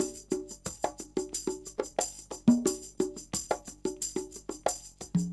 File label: con tam, Percussion; Big Fish Audio - DOM